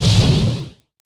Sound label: eject seat; mcheli